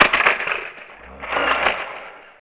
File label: CYBER KIT, DRUMS